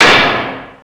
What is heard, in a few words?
slam